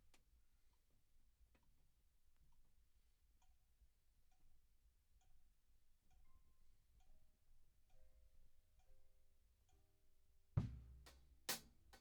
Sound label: Drums